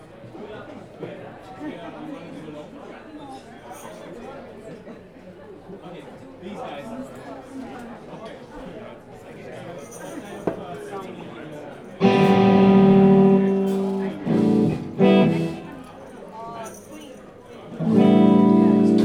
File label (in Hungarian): hangolas, romkocsma; szimplakert